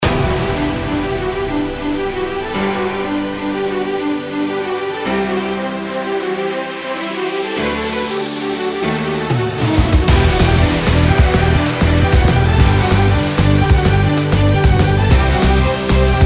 Raid Boss; Mist Train Girls

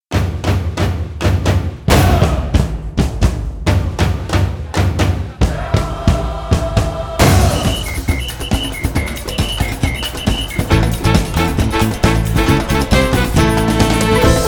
Samba; Angry Birds Rio

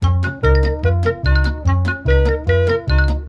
Comedy music loop

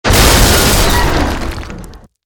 Enemy Fighter Explosion; mobile gamerip 2014; godzilla smash3

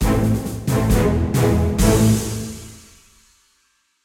fail game lose level; gamerip; Hell Warders

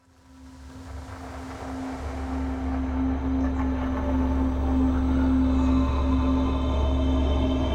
countdown 120bpm intro; Doom 2016

